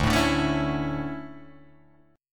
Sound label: D Major 7th Suspended 2nd Guitar Drop D, chord